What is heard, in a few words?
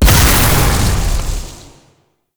Explosion Medium; Weapons; Warframe; Duviri; Incarnon Adapters